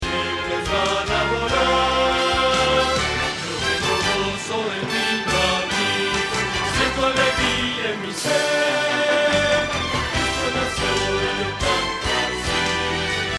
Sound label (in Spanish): Bajo